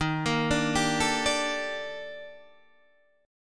D sharp Major Sharp 11th D sharp Guitar DADGAD, chord; D#M#11; arpeggiated; 13,13,12,12,12,12; chord shape